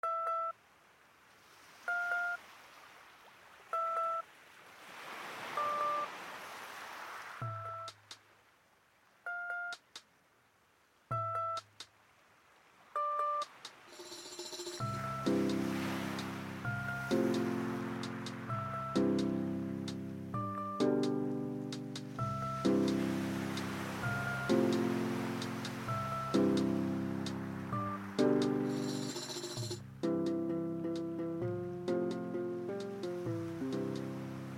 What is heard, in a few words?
calm, music